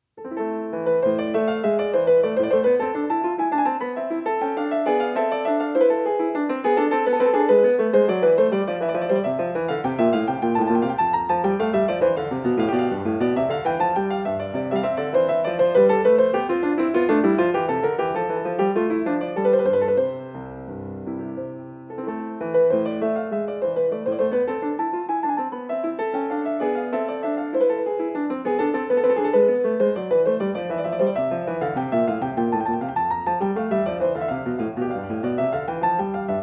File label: Courante; English Suite No. 2 in A minor; BWV 807; BACH; ENGLISH SUITES